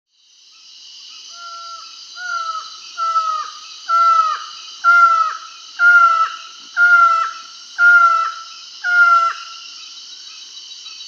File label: Slaty-backed Forest-Falcon (Micrastur mirandollei), Peru; S. America